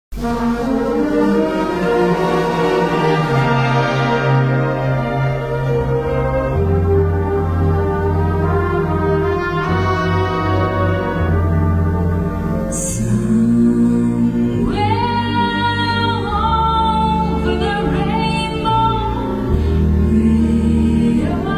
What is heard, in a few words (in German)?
Sinfonisches Blasorchester; Lichtbergschule Eiterfeld